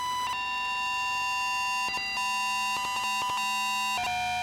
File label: puppet noise; phantom